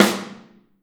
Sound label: SN OH L, Kit OH Mic, Drums Percussion